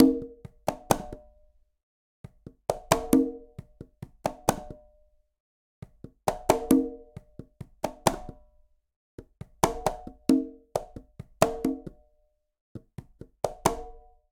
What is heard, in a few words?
Ballad Conga-67bpm; Latin Percussion; Ableton; Factory Packs